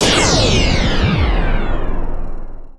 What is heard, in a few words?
Warp Mess; Sony Ericsson; F305; PlusFourSix; Ying; Lars Edvall